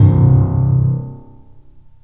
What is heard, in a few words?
PLUCKS, The Piano; InVision Interactive